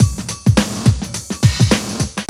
loop, Roland; Sound & Vision - Gigapack I

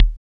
FM Perc; Rap

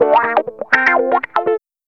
GUITARS 125, House

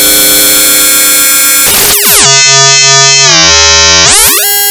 noise, noise kit; Big Beat Samples & More; AKAI

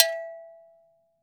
afro ago, afric ago go; AKAI S-Series CD-ROM Sound Library VOL-5

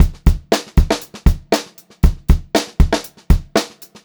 Pop-Rock Drumloops 118 POP VARI; USB Soundscan vol.37; AKAI

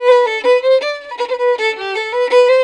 VIOLIN, Celtic; USB Soundscan vol.42; Celtic Flavours; AKAI

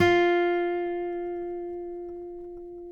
F, guitars; Spectrasonics; Hans Zimmer Guitars VOL-2; FNGSTYLE PFM

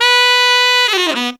TENOR FX, Horns; Zero-G; Phantom Horns

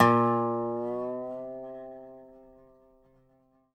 DOBRO UP; C3

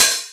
Boss DR-202 hat

